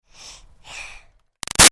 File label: Baby 6 Month Old Breaths Sigh; Babies; Voice; Big Room Sound